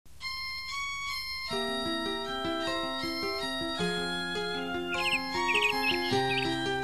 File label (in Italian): folk